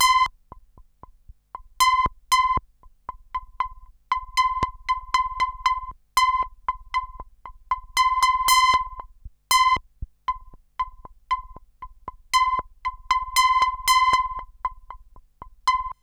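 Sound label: sample and hold C5; Best Service Dream Experience; R100M S+H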